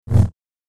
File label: Double Bladed Saber Sound; FXHome